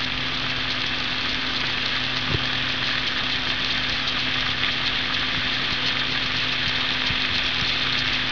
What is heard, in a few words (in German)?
PFANNE